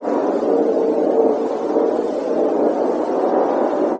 wind

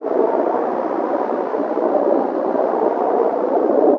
wind

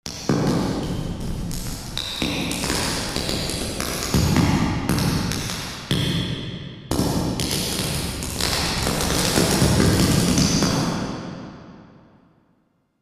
Hall; SPX900

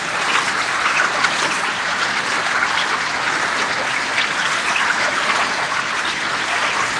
heavy rain

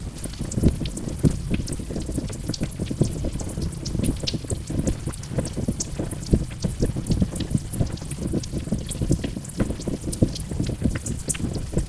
fire

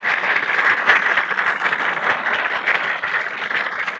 applause

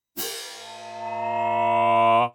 cymbals tenor

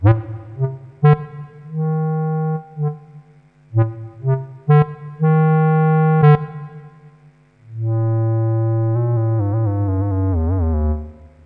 clarinet

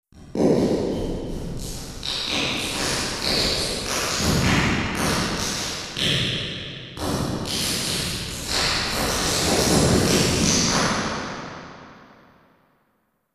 reverb hall; reverbs; O2R

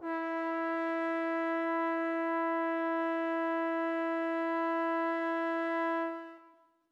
flute trombone; interpolator

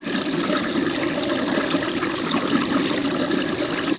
sink

